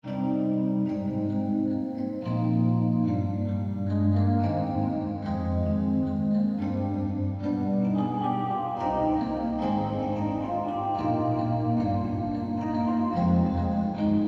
vintage key wet

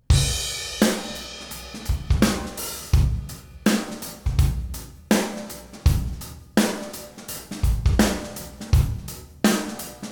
Groove Drum Acoustisolo

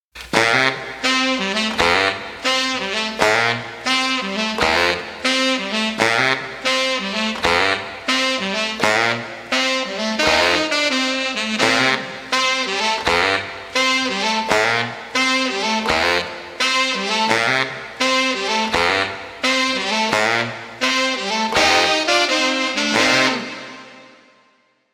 sax mix

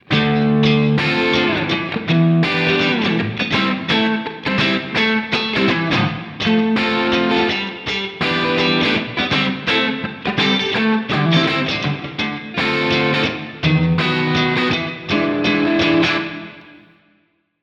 clean e guitar mix

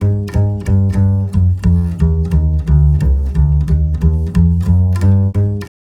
bass dry

